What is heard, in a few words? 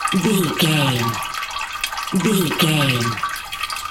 water running dripping wee loop